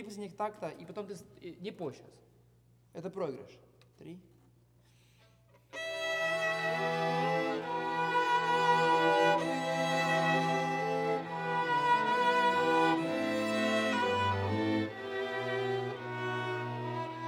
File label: Overhead